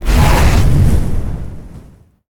Death Wall Expire; Evolve; Elder Kraken

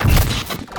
Chain Lightning Fire Ramp; Evolve; Elder Kraken

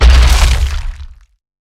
Jumpland Walk Run, Behemoth; Evolve

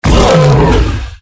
dragnold hit; Jaminporlants

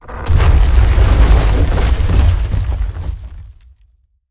Rock Prison Formation; Evolve; Behemoth

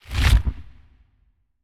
Ability Gooberball Slow End; Evolve; Gorgon; Lurker

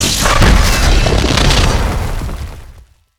Charge Attack Vox FLAME; Evolve; Meteor Goliath

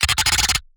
Exertion Light Breath; Evolve; Gorgon

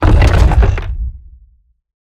Roll Surface End; Evolve; Behemoth

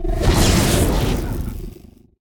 Banshee Mine Launch; Evolve; Kraken

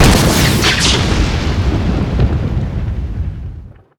Lightning Strike Fire Strike; Evolve; Elder Kraken

